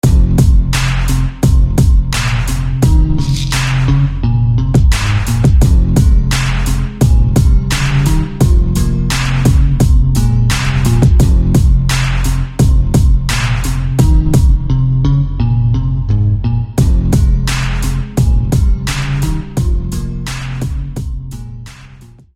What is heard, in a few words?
Detroit Guitar